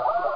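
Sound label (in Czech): Pejsek, little dog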